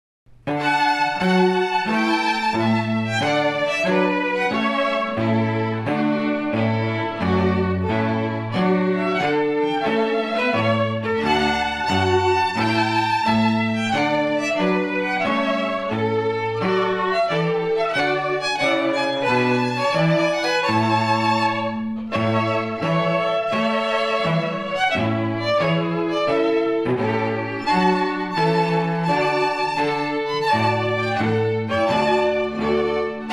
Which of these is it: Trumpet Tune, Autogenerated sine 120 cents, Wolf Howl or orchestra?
Trumpet Tune